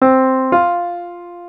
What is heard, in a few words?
interval